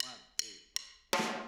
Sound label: Room, 162 BPM; drums; Drums from the Big Room The Mixes; Take Your Toms Off